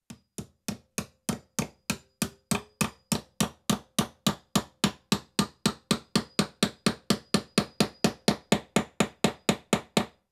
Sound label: Floor Nail Hammered (Faster Rhyth; Construction; BBC Sound Effects Library